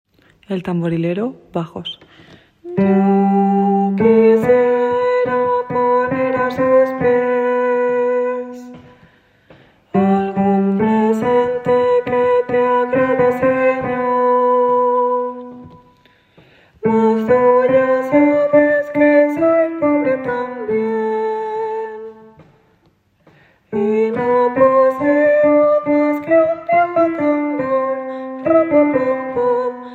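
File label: bass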